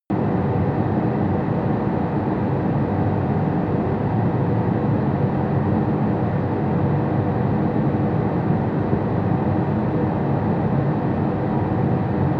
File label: factory room tone fan